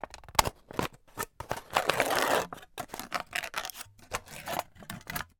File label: animals fish food flakes plastic tub foil lid cap tear open peel back